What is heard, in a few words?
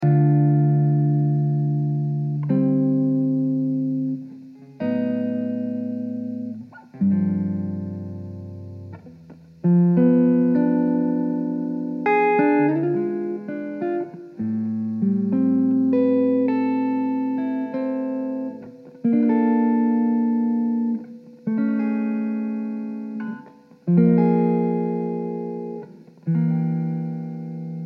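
Dm 100 Jazzy Elec guitar chords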